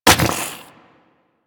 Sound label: Chem Strike Nox Rifle Projectile Impact